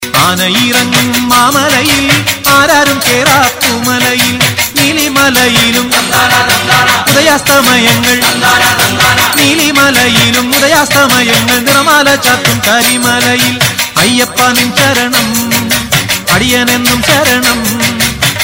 malayalam hip hop tone

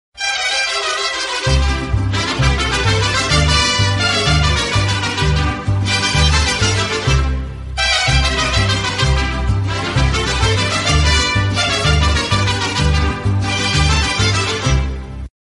mexican tune

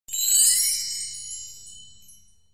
fairy notification

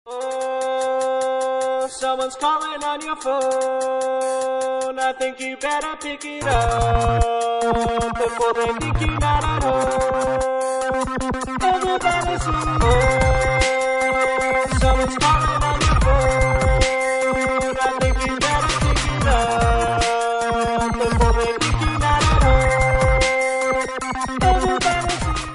dub ringtone

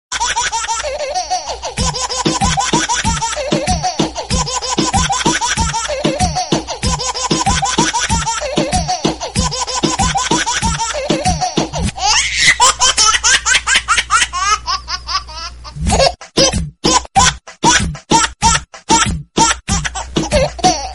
baby mexican rap tone

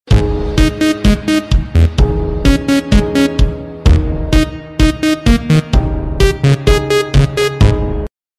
electro

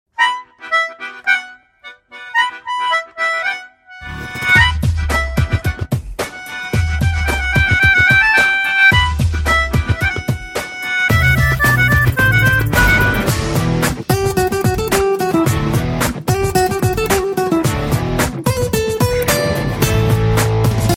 emo instrumental, ringtone

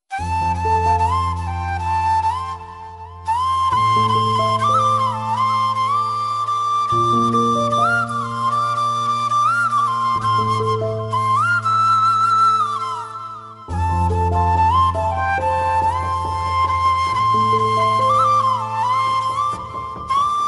tamil emotional

